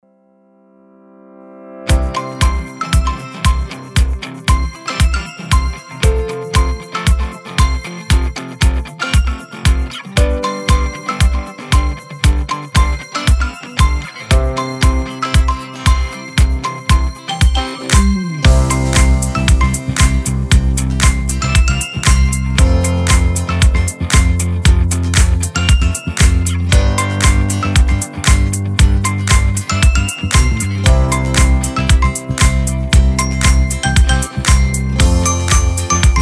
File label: Christmas Bells, ambient industrial